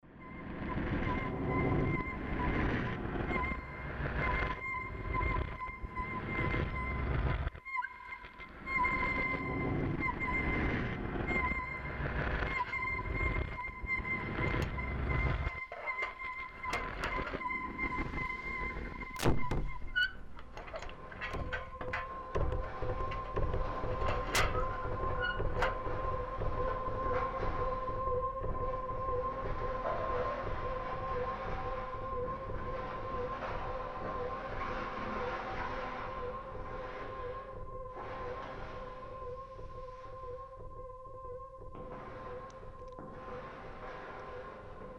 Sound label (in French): melodie orage